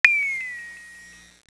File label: flex bend; Sound Bar; Midisoft